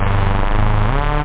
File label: lfo, effects